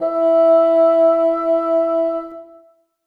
bassoon e4; Sonatina Symphonic Orchestra

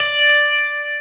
DARK D3

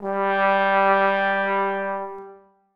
tenor trombone g3; Sonatina Symphonic Orchestra

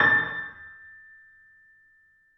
piano f a6, Grand Piano; Sonatina Symphonic Orchestra

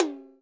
TOM F; akai; mpc1000; factorypresetsamples

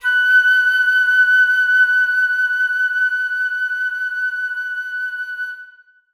flute f#5; Sonatina Symphonic Orchestra

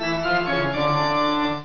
MATE; Pocket Chess; windows ce

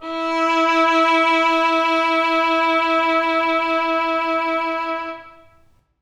violin e4; Sonatina Symphonic Orchestra

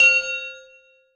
glockenspiel c3; Percussion; Sonatina Symphonic Orchestra

